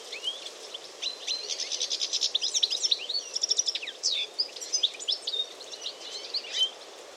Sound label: goldfinch song